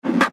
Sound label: Go Kart Track Cone, Go Kart Track Traffic Cone Pick Up Interior Garage; SPORTS; Go-Carts (Sound Effects:Ve; Blastwave